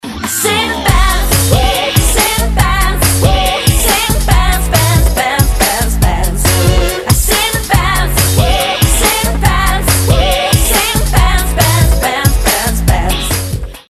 singing pants

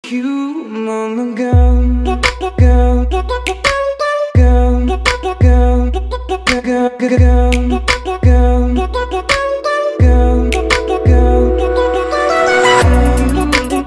ringtone chill trap

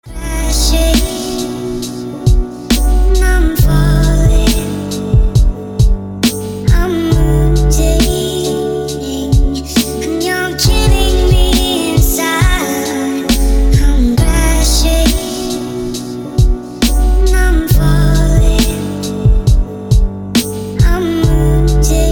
vintage beat

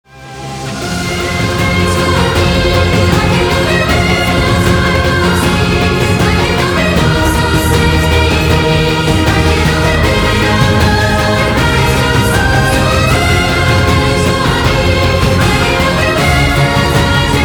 epic music

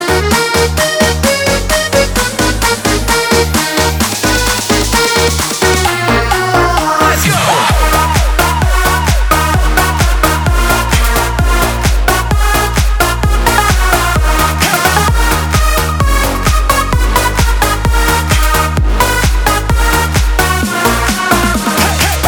melbourne bounce mix

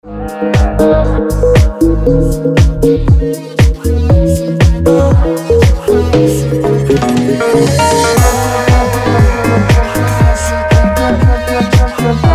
instrumental

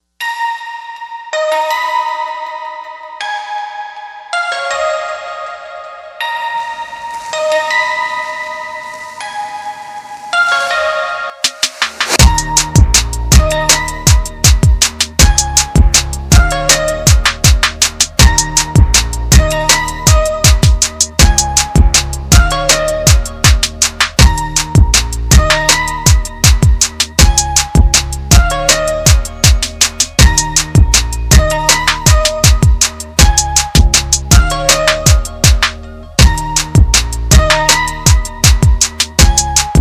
hip hop no vocal

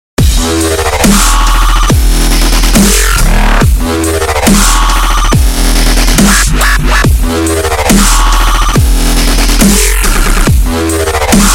bare bass

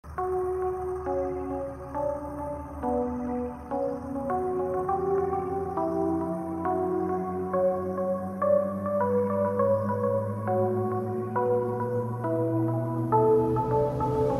cover version; Alien 3 level 1

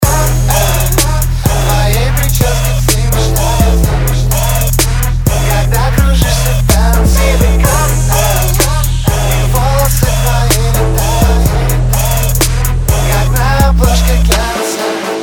trap mix

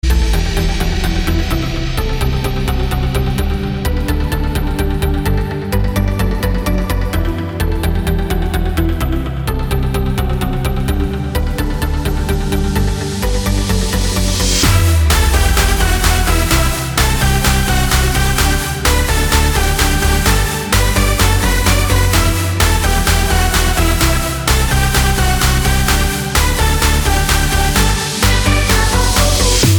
electro house

